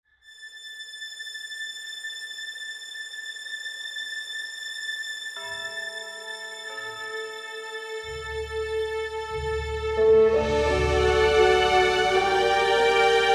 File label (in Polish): karaoke